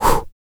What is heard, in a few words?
Vocal, R&B & Dance, FEM. HUMAN